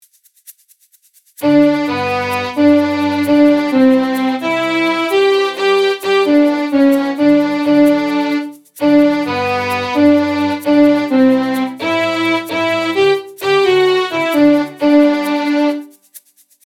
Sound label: we wish; Takt 1 - 8; We wish you, we wish you